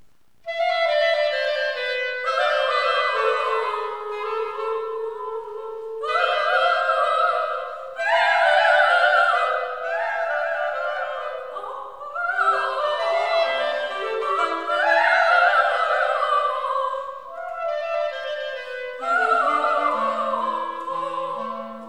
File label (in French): voix clarinette; Turn thine eyes; H.Purcell